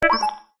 science fiction robot small beep chirp

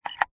household lock metal turn click contact mic

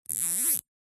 industrial zip tie fasten fast; zapsplat

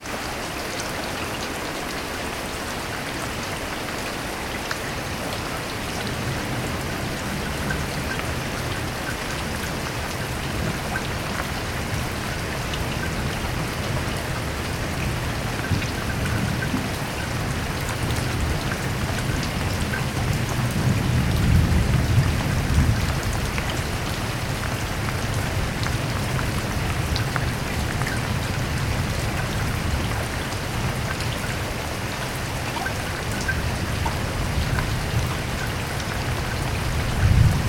weather thunder long rumble distant rain med; sound effects one

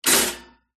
household toaster pop up

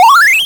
video game retro 8bit die lose life

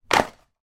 cardboard drop on table; foley

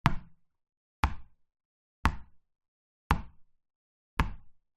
sport handball bounce x5 wood floor internal

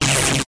sound design electricity zap spark; zapsplat

